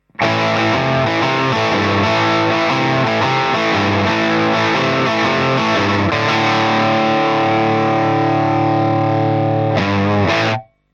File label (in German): thc sunset 212 V12 voller poti neofin, Boutique-Gitarrencombo